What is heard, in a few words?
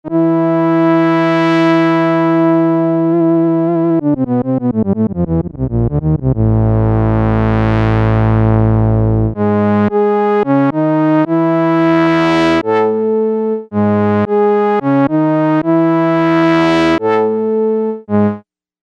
Mono Brass